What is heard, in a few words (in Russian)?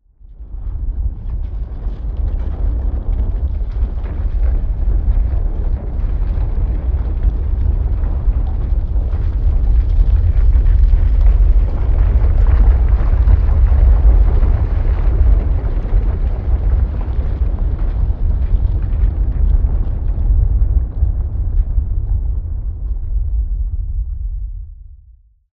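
осыпи камней гул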